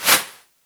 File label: Arrow Air; SteamVR; InteractionSystem; Longbow